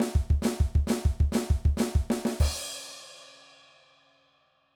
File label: Punk Fill, drum